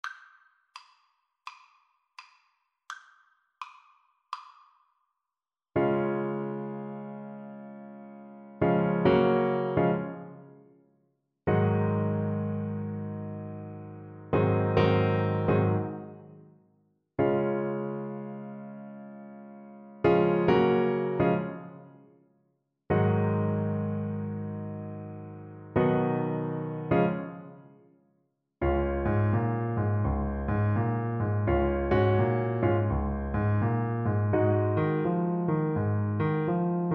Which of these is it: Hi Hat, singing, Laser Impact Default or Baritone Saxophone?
Baritone Saxophone